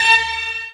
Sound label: BRASS ATTACK, Hits & Brass